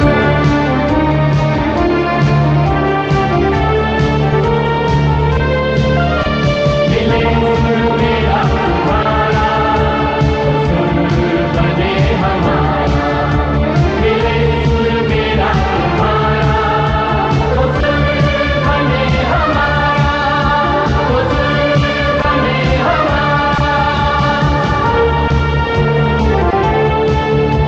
Beat; August 15

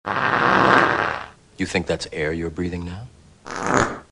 Fart; Morpheus

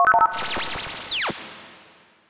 Disarm Sound

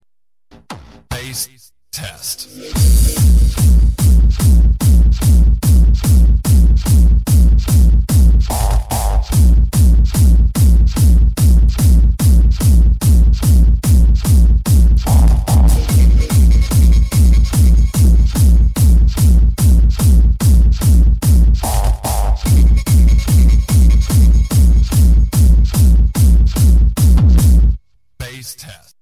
Bass

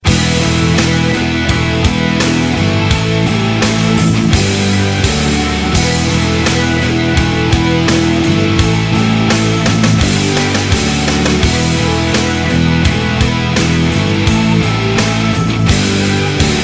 Turkish Rock

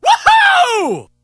Jumping Taunt

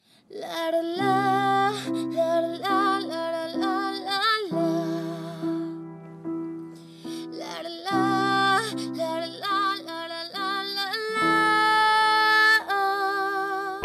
Lalalalalal

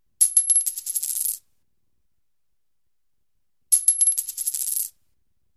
Coin Msgtone

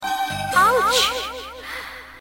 Aaaaaauuuuuccccchhh

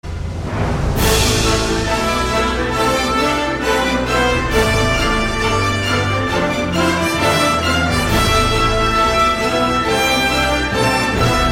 Orchestra Tune

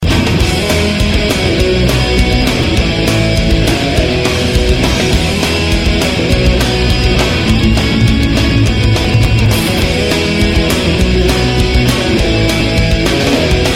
Rock

